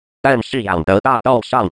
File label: vocal fx 138, Techno; Techno ID; atmo+fx-loops